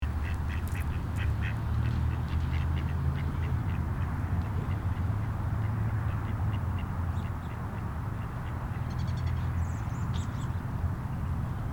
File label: ducks park; ann morrison park